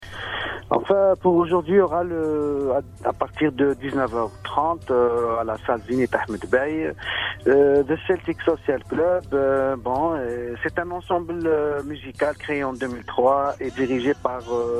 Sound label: jazz